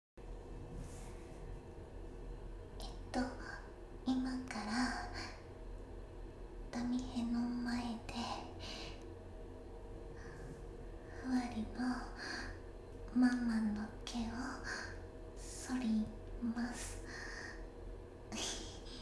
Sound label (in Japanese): 環境音あり